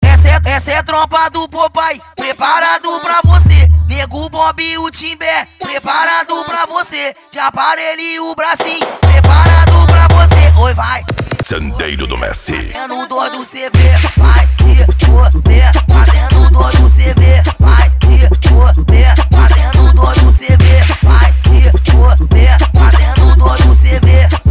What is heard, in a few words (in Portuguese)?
funk mega bass